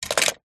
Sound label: leisure toy plastic figure down on wood surface